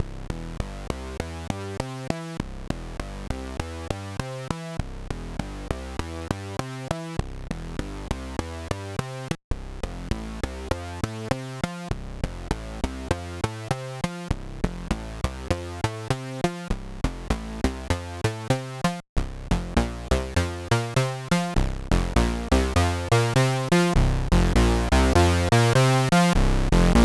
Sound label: Analog Envelope; synthmaster